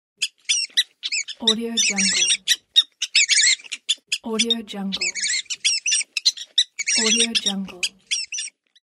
rat

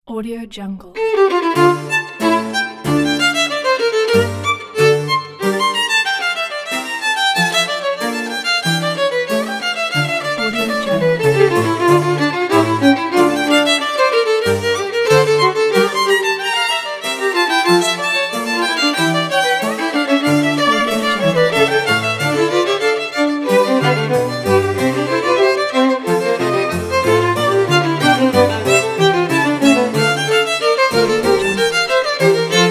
Bach concerto for 2 violins chamber orchestra; eddiehoncha

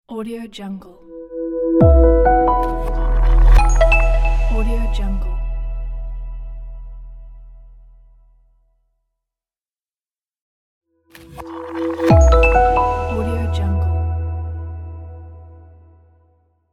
minimalism logo

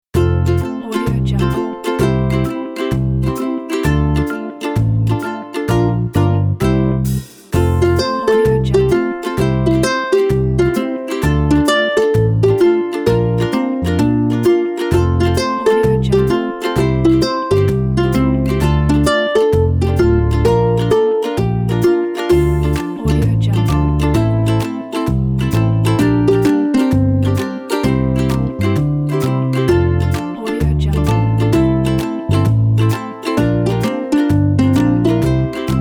perfect mood ukulele

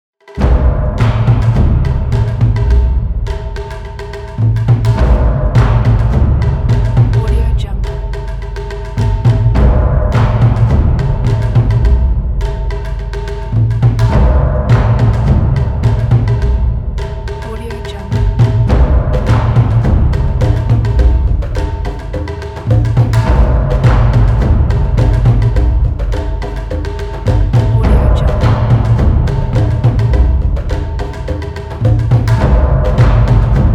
dark percussion

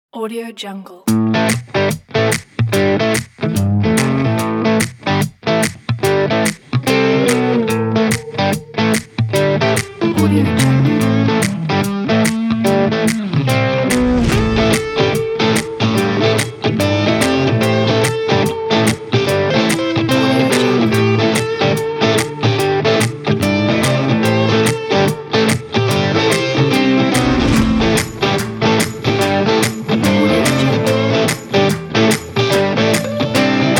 blues; blues and cigarettes

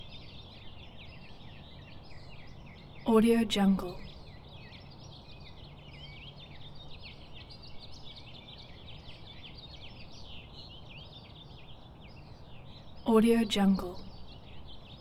morning birds

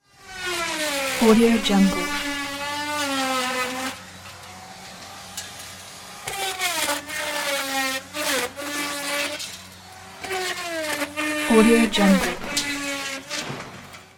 wood router

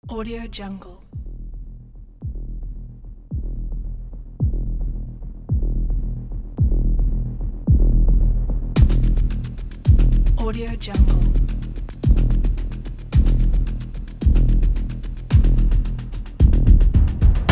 dark action trailer; soundhorizon

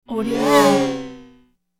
buzz by whoosh; hollywoodedge

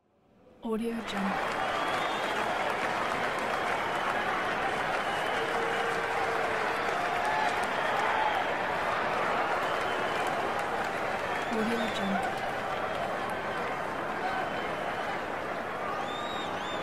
football stadium ambiance

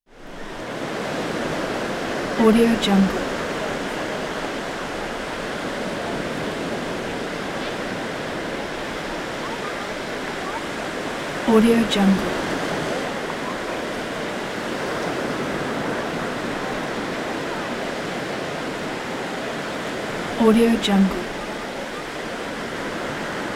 ocean sea beach ambience

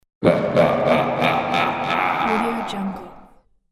scary laugh

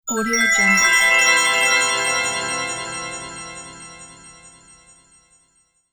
elf serenity spell; sonic-boom

